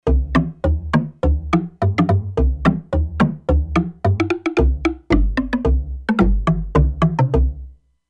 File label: Big Log; Factory Presets; E-mu Proteus-2